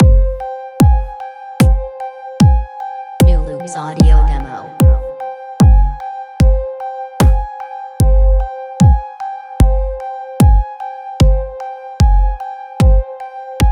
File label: punchy kick drums